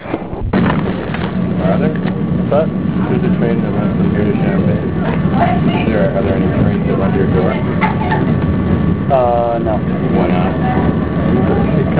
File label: train